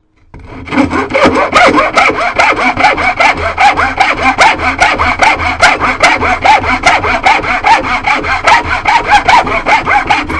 Handsaw Squeaky, A high quality up close stereo recording of a squeaky saw on wood; Hand Tools